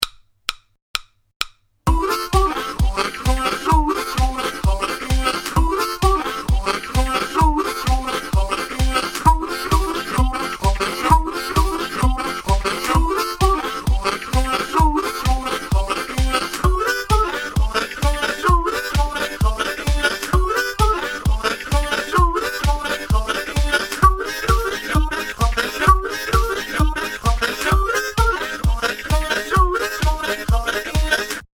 Speed 130 Rhythm Cup; My First Rhythm Cup Explorations

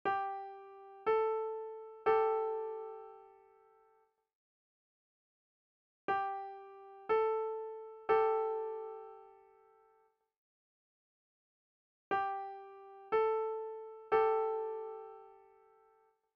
treble g a

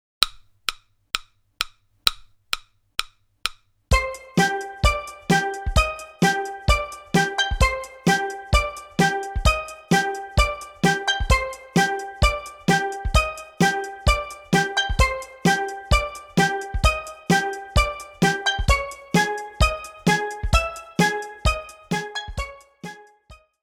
Eighths Syncopation Medium

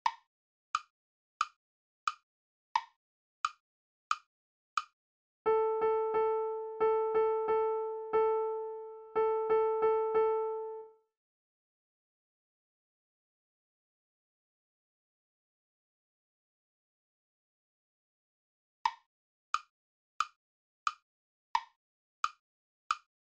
rhythm

